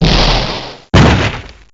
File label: cowboy knockdown